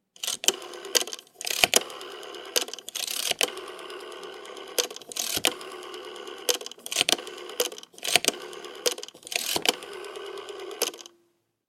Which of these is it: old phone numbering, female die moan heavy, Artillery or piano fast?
old phone numbering